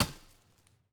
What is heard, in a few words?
lumber tree